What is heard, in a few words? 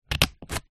office scissors cut paper close up; zapsplat